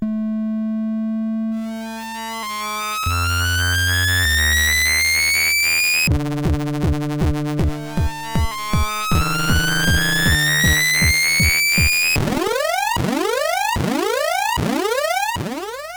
Tinnitus, sid, CSG8580R5

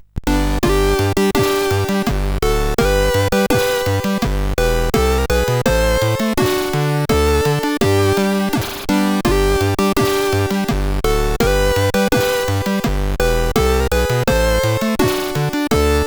o christmas tree sid CSG8580

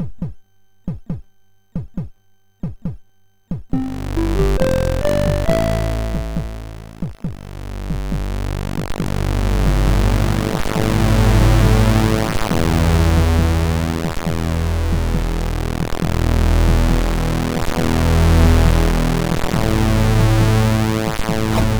Sound Sample, sid MOS6581R4

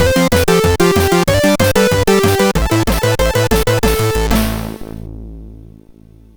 sid MOS6581R3

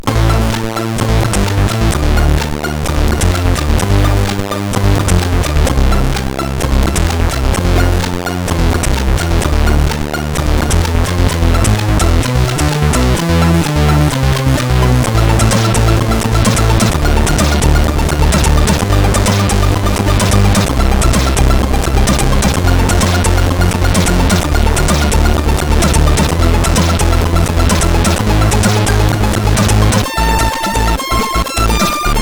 tune, sid CSG8580R5; Last One tune 2

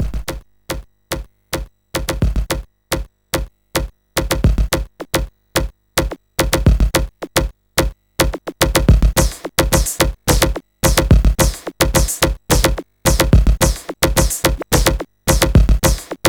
Musik Run Stop, MOS6581R4

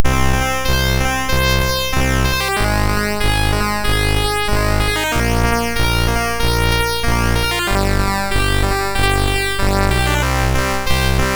Simple Tune sid MOS6581R3

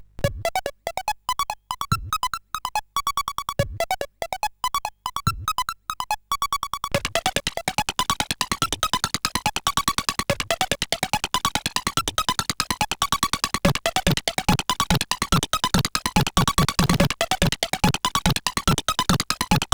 Jungle, CSG8580R5

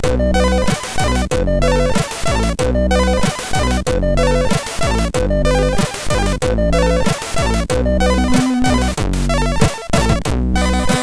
Rege, MOS6581R4; sid

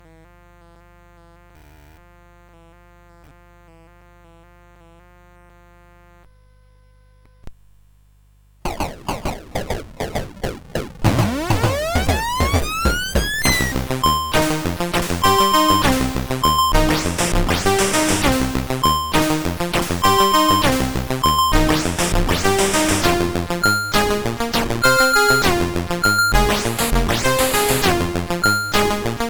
Synth

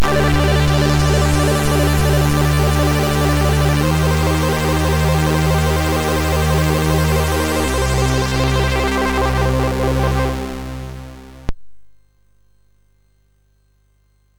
Sample Music, MOS6581R3; hvsc

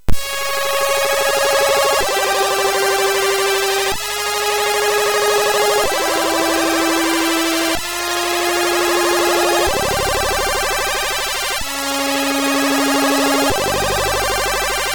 Snare MOS6581R3

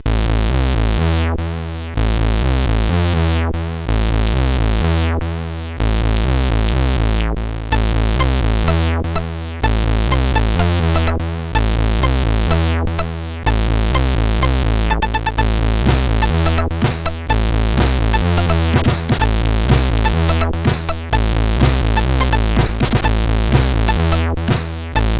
Action Drums, CSG8580R5; sid

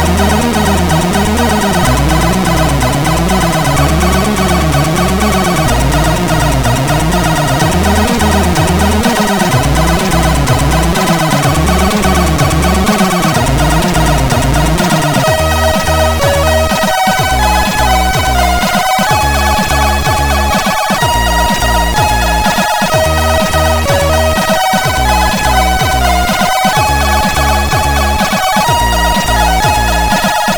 Crack Intro, CSG8580R5; Zzap

